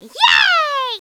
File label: yay; DS; ML BOWSERSINSIDE; Toad